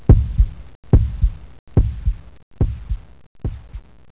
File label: heart